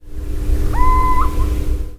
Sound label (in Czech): sýček vokální souboj samců